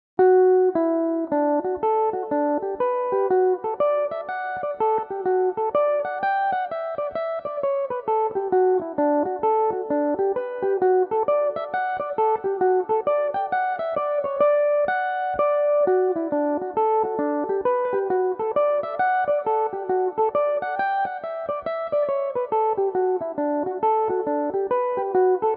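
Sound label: hornpipe d major, mandolin; Higgins' Hornpipe; Belmuse EM-190 electric mandolin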